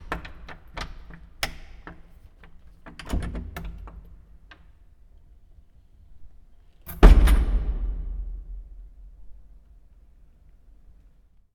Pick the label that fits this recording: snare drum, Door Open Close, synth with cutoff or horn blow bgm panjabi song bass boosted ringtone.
Door Open Close